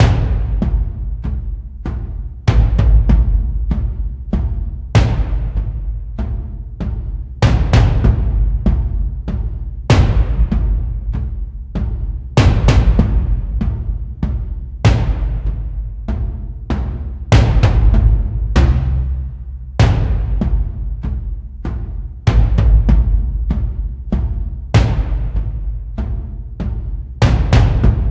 Caveman Paleo (Lose); Cooking Dash

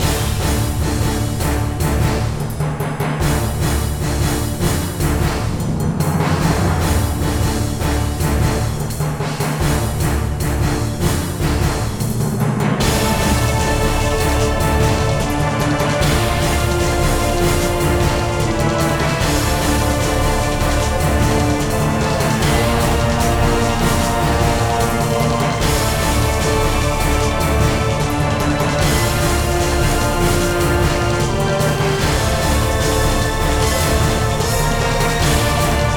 loop multiball; Hercules

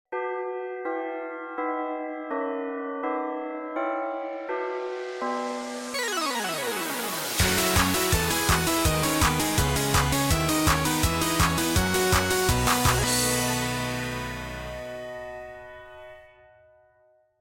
Great Victory; Animator's Hell

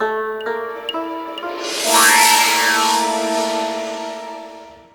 stinger

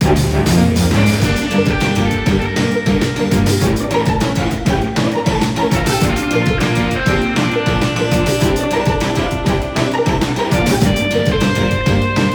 finalboss 200bpm; chicory a colorful tale

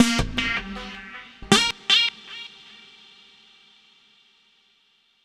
Tone

